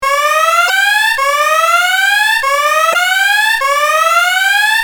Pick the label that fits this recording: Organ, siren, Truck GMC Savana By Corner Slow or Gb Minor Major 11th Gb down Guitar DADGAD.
siren